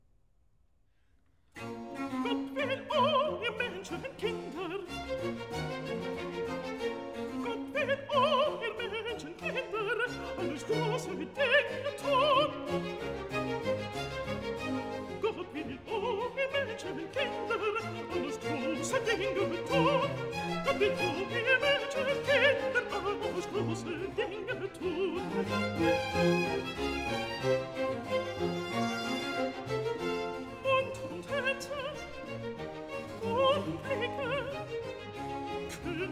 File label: Aria (Alt), BACH for ALTO; Gott will o ihr Menschenkinder